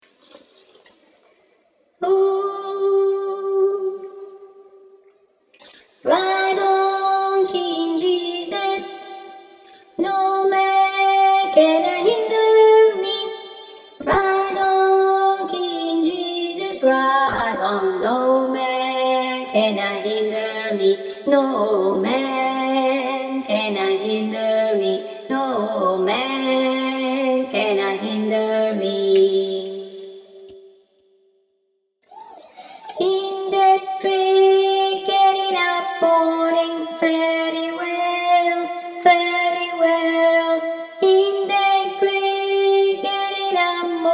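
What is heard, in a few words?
ride on king jesus, contralti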